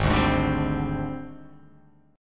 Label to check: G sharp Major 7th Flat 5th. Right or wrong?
Right